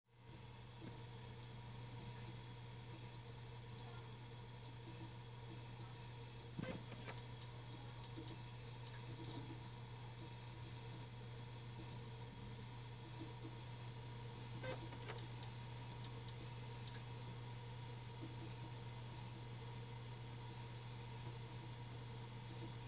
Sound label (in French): vocal